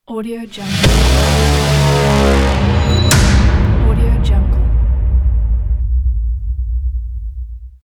horn impact

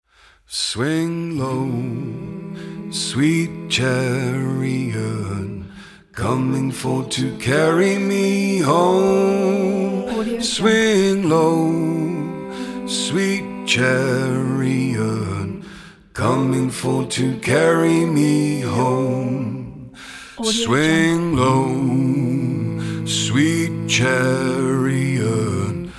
swing low sweet chariot

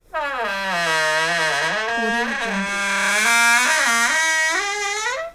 squeaky hinge musical

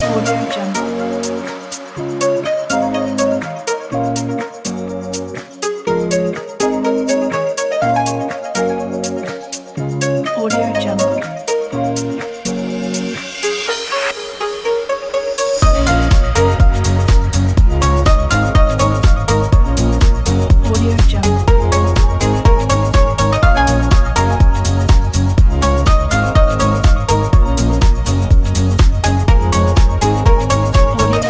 tropical music; eco-music

